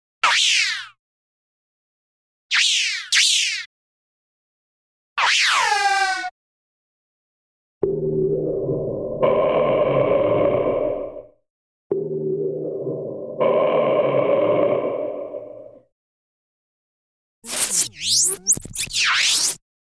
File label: Sci Fi Ricco Zap, Sci Fi Thin Double Ricco Zap, Sci Fi Space Ricco; Underground Caves